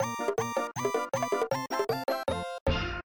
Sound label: Bonus Screen Clear Fanfare